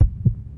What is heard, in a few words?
Breath